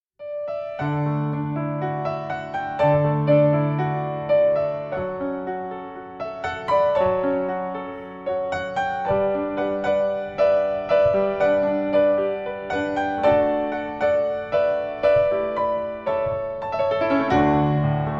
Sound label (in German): Piano-Meditation